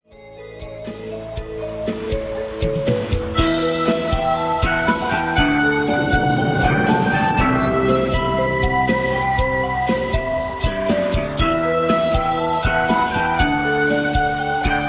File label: cafe music